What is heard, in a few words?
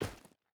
footstep rubber boots stones run; Rust